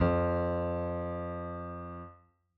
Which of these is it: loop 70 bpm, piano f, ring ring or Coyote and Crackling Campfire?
piano f